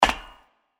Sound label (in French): Footstep passerelle metalique; Blind Survivor